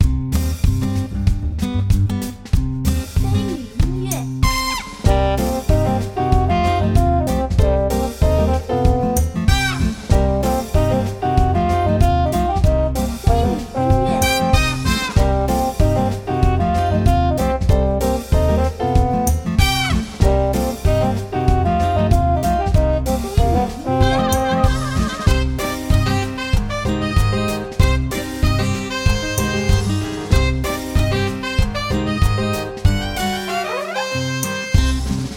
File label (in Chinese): 决战开始音乐